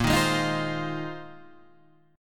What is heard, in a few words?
F Minor 7th Bb; Fm7/bb